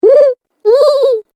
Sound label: Cartoon Game Voice Chuckle Sounds